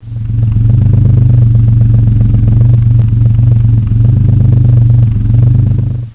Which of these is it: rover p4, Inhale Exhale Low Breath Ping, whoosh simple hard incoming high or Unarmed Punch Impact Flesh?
rover p4